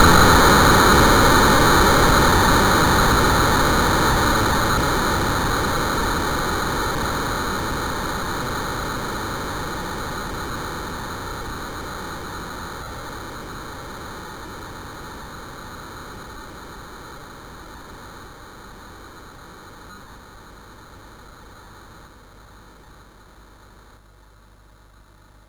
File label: Frantic, MOS6581R4